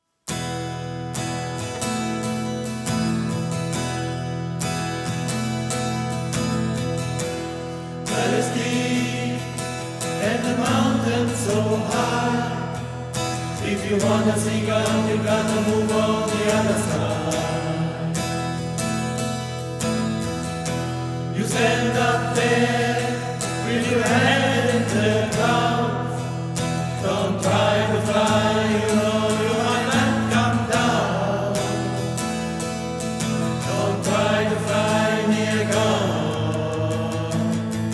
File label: Hymn